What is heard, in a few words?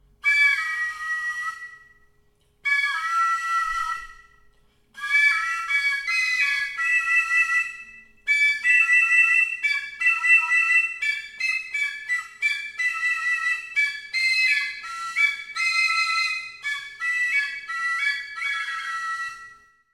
gaita; peruart